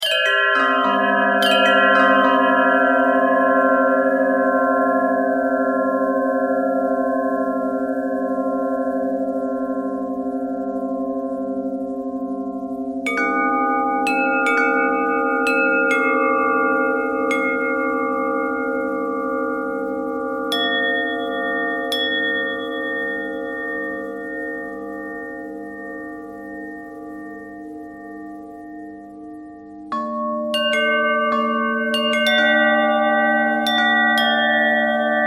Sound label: bell bendtwist